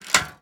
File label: Menu Mechanical; Menu FX